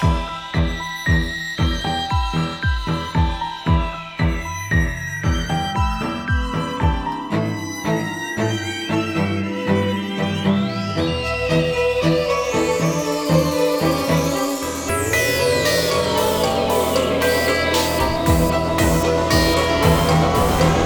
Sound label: BG Music; Wild West Shootout (Arcade) (gamerip) (2019)